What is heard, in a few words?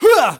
Male Attack Grunt